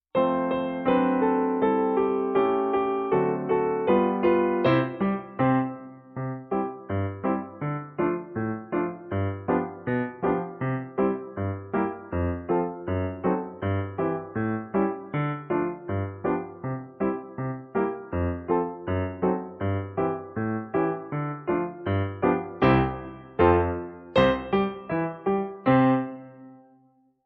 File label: Abertura PIANO; Três histórias da vovó